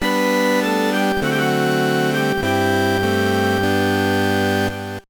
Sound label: Save Hymn; Slime Mori Mori Dragon Quest Shougeki no Shippo Dan; GBA